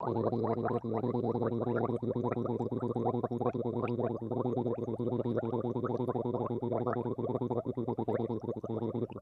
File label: Gargling